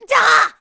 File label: Scared; Link; zelda sounds